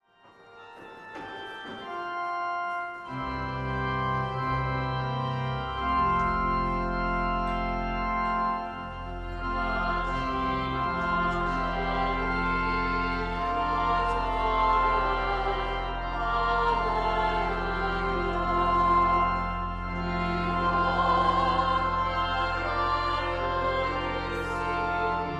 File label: Regina Caeli choir; St J Mass